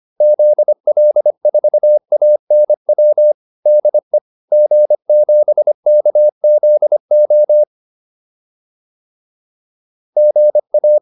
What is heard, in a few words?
QSO, intermediate practice files